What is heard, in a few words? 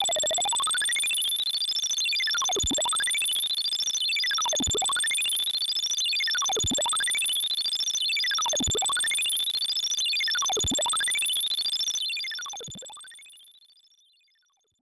Ringing Melody